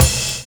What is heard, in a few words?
LOUD KICK; Univers Sons